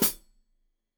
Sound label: Hat Foot, Hi-Hat, Cymbals Stereo